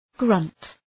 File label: grunt